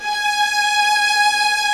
ARCO VIOLAS; OMI - Universe of Sounds

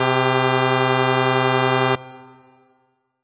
Lead C3; 90s Sample CDs; Club Techno

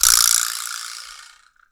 VIBRASLAP

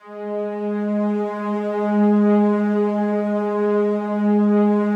SLOWSTRINGS, G#2; Yamaha VP-1; 90 s Sample CDs; Music Store

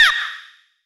Vox Hit C3; Club Techno

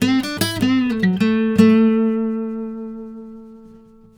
BARI LICK, Blues Guitar; ILIO; Fretworks; 96 BARI LICK

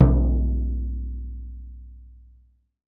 SURDO OPEN; Latin Drums; Latin Drums & Rain

